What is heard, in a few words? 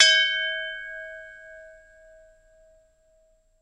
Champagne Toast Ding, glass clinks